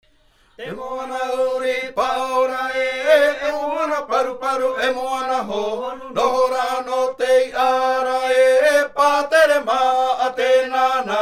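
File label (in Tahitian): Himene tarava Raromatai, chants polyphoniques maohi; Maru teitei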